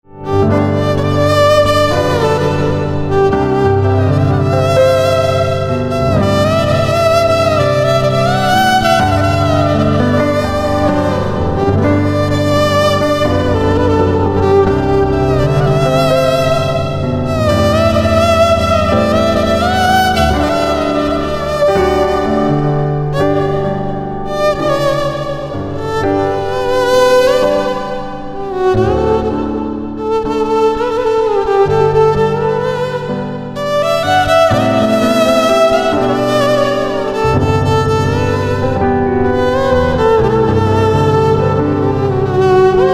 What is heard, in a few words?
Violin